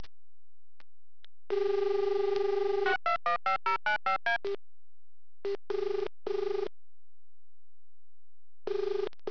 AXE10 ring

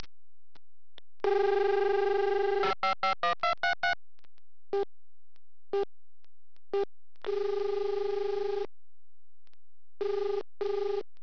5ESS ring

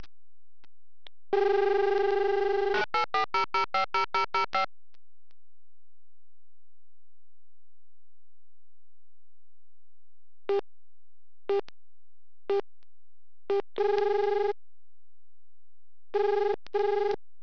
DX200 MSC ring; skycell MSC

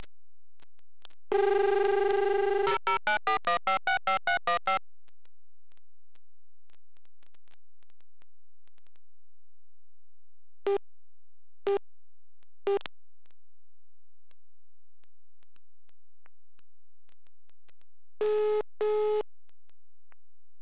srilanka ring

